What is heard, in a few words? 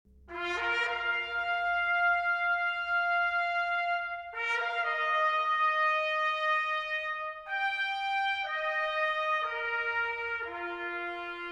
fanfare; excopland